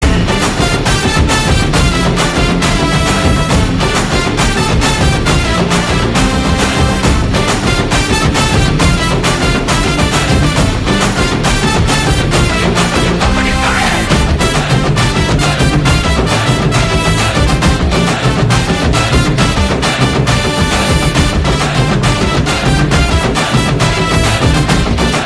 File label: Kabaddi Love BGM; Vaathi